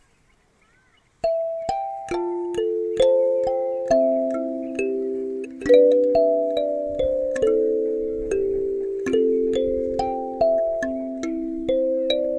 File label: kalimba cover